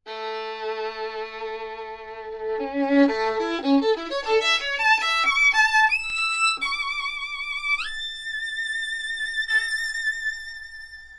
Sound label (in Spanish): SONIDO DE VIOLIN